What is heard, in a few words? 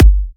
Electro Kicks